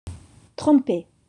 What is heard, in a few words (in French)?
trompes